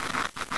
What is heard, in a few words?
PEN